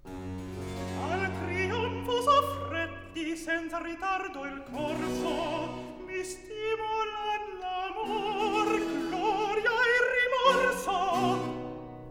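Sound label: Recitativo, Handel opera; David Daniels; Act 3; Al trionfo s'affretti; Handel - Rinaldo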